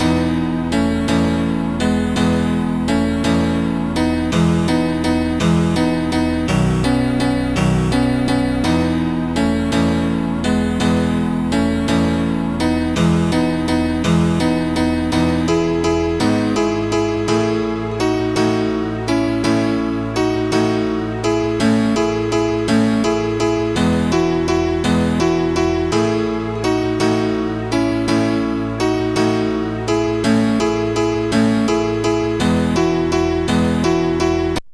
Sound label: piano tune; MusicLine